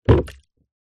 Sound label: household mop bucket plastic shallow water set down floor